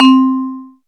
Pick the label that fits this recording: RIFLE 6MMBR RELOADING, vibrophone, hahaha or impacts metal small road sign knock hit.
vibrophone